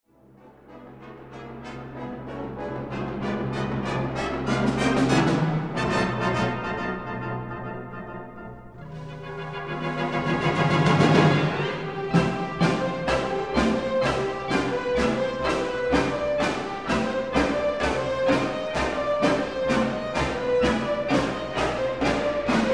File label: Jig